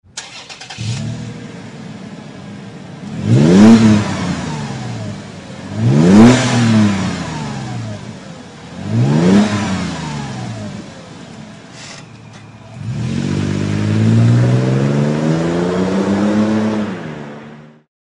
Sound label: magnaflow exhaust systems